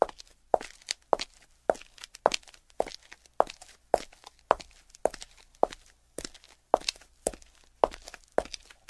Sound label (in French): bruit pas paves